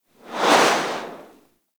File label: Grenade Projectile By; Grineer